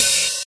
subtle open hi hat sound A# key, open hi hat sample tuned to the A# note